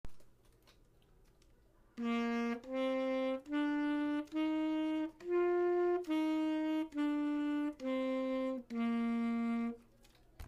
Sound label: alto sax